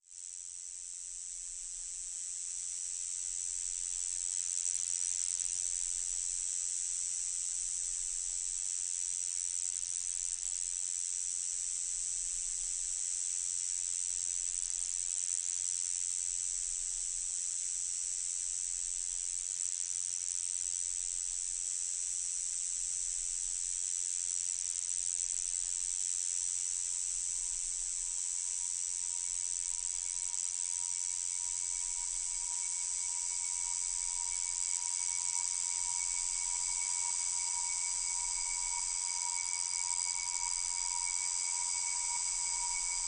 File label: Cicada Subtle